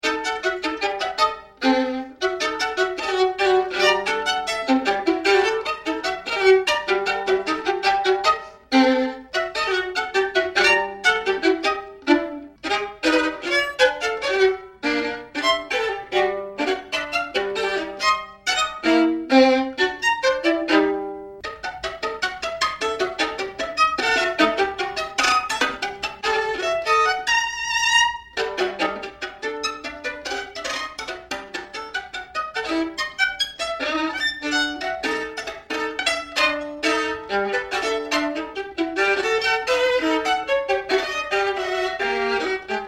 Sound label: VIOLIN QUICK